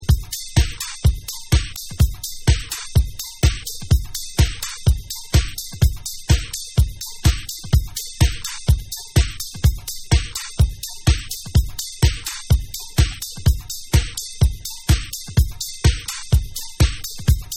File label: Beats